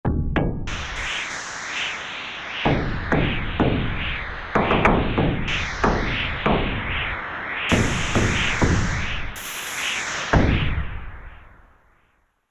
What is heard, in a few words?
Split, Korg MSC-12S Synth; Korg MSC-12S Synth 3